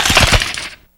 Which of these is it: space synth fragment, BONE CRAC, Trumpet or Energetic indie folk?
BONE CRAC